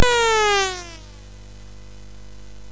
sid MOS6581R4; Music for Game; hvsc

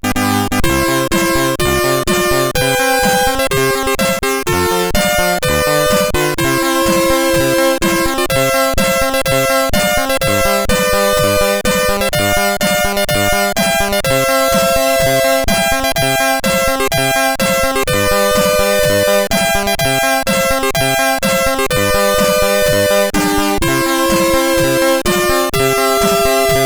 French Anthem, CSG8580R5; Wijnhoven Joachim

